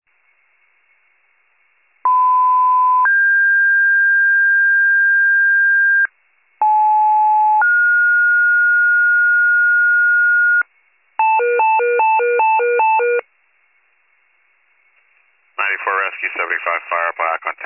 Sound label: fire, pager alert